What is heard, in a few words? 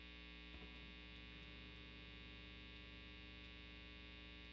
quilter r121